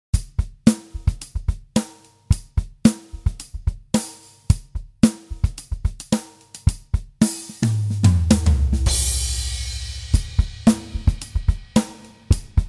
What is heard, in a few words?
Drums, Reverb; AtomicReverb